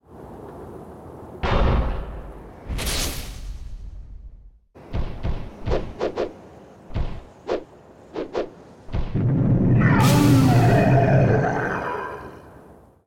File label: Appear